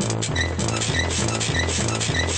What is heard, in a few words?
cart; BALLY; CORVETTE; Son Corvette Original